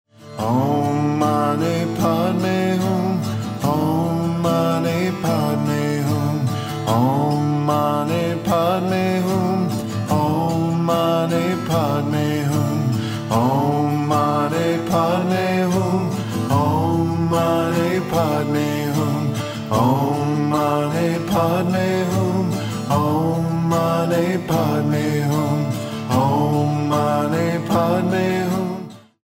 Om Mane Padme Hum, Chants

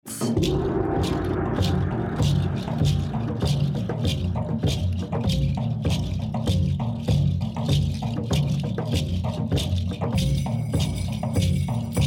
Native American Beat; Sacral Chakra

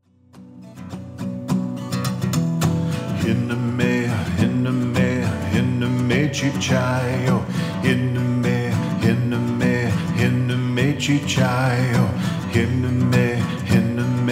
Apache Power Chant

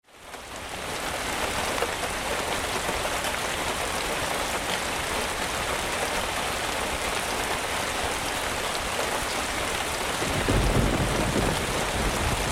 Thunderstorm

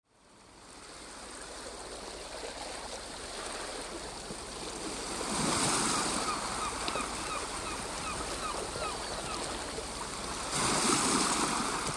Shore with Birds

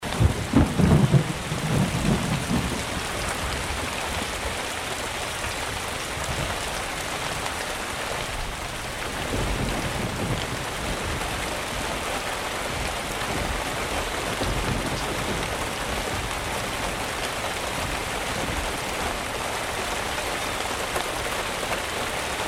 Thunderstorm

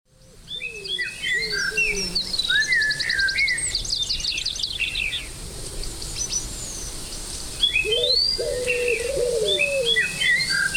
Breezy Fall Day

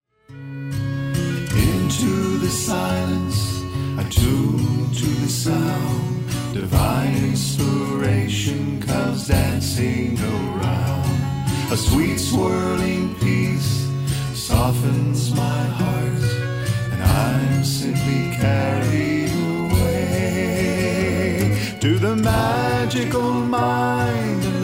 Tuning

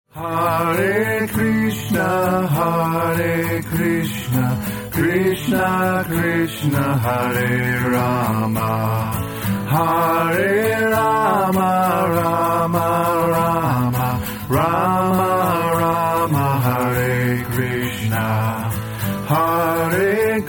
Hare Krishna Hare Rama, Chants

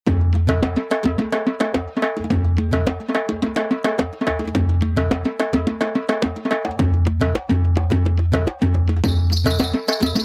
Arabian Mix

